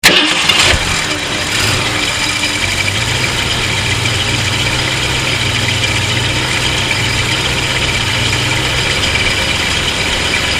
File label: 1966 ford fairlane 500